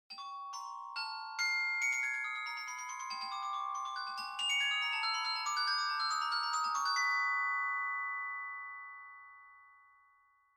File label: glockenspiel; tapspace; sibelius sounds essentials